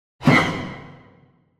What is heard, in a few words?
Symphonic Hit Short Tension Hit; Hollywood Edge; sound designer's toolkit v2